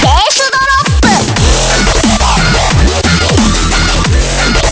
applause

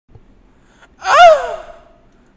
moan

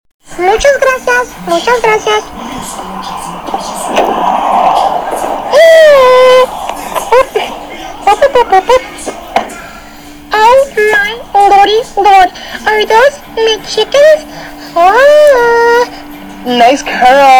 fart curl

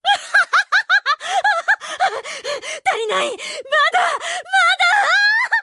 win

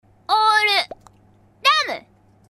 polka

